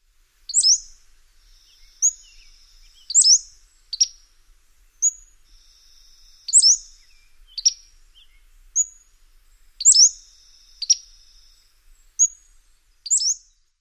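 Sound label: song, Pacific-slope flycatcher; WA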